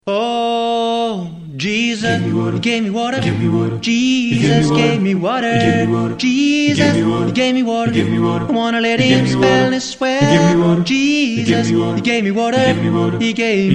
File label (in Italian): Jesus gave me water (Alti e Bassi); guida ascolto; Gruppi Vocali